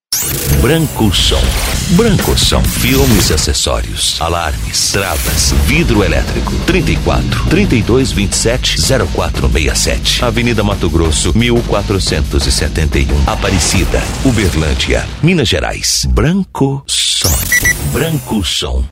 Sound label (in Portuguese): dance e funk retro; branco som